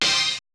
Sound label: perc, Roland MC-909